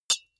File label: deodorant hit, metal; maschinen; soundpool